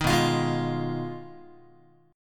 B 6th Flat 5th B down Guitar 4A 4E 5A 5D 5G sharp 6C sharp; strummed